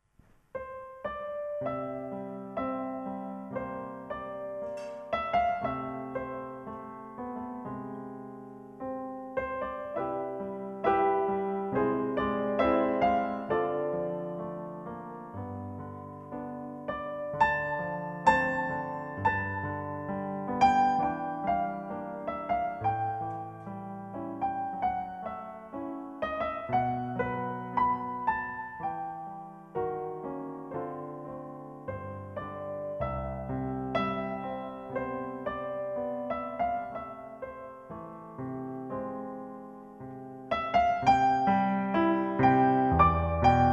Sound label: solo piano, cocktail piano